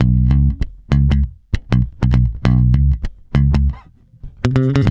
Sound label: C#, mute, bass; Spectrasonics; Bass Legends; DEF MUTE